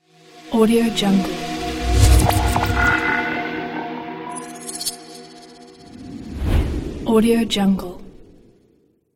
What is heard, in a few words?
hitech reveal logo